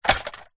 science fiction robot android metal single footstep